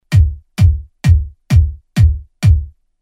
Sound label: Solid state bass drum